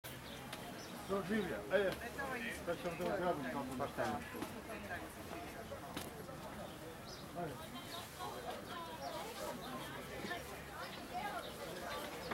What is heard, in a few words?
People open market